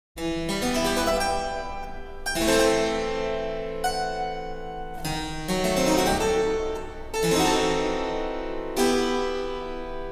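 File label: harpsichord Partita 6 BWV830; Skott ROSS klavesin; I.S. Bah.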